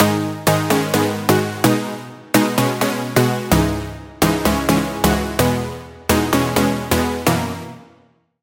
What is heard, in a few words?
Lead, Sylenth1; Routed Sound; Sylenth1 Expansion - Star Scavenger Vol.1